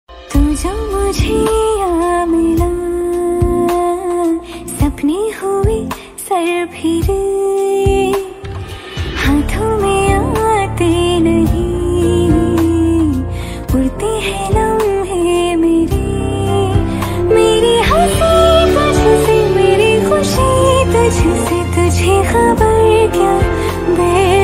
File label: female version girls love song